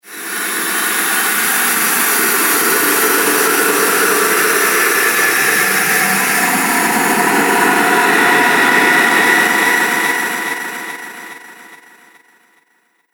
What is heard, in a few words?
Chamber Of Hell, scary soundscape; Fantasy Sound Effect